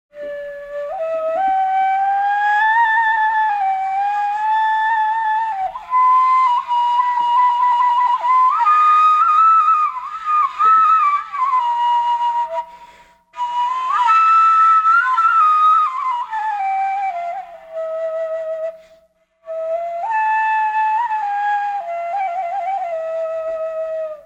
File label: kaval